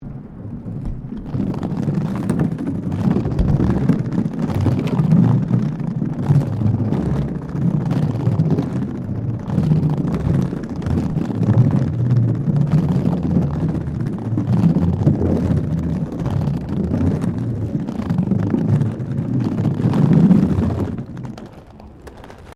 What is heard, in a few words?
ROLLERBLADING BOARDWALK ROUGH SEQUENCE; Sports